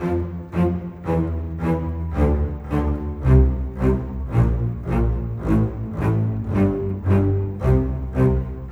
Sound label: Rock Pop Bass Cello, Orchestral; Sonic Foundry (Sony Creative Software); Orchestral Series VOL-4 - Rock and Pop